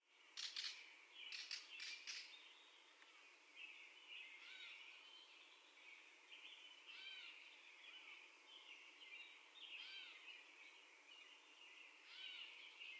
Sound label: Catbird First Song